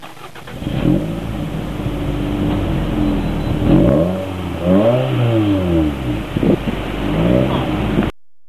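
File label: 3SGE original, muffler, TOYOTA CURREN ST-206; 純正マフラー; Curren Touring club "pleasure"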